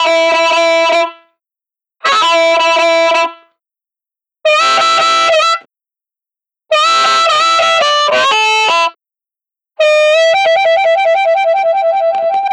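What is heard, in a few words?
Blues Harmonica Ending; Digidesign; Pro Tools Essential Loops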